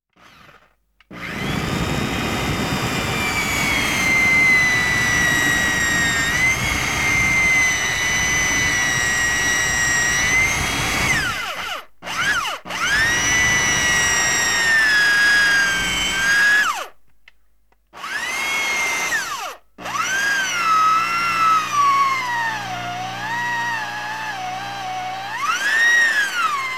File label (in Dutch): Elektrische Boor; KMP Film Video Sound Effects